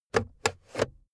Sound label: car backseat table pull in cupholder slow